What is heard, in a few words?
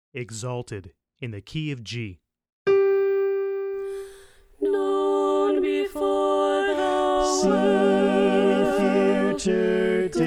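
Exalted Alto Left, choir part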